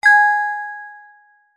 multimedia alert notification basic bell synth positive ping; zapsplat